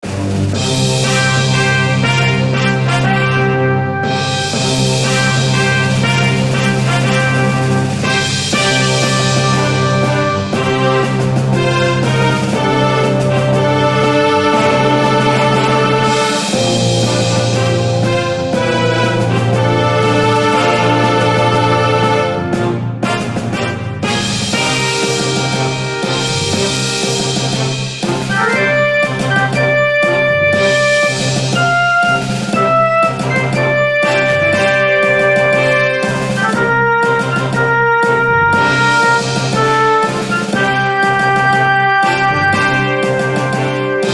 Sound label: Bgm Fantasy